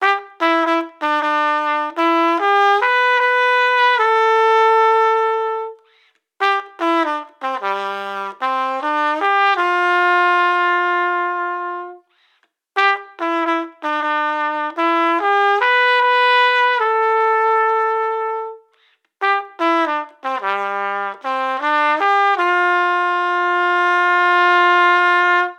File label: 75 flugel melody Gmaj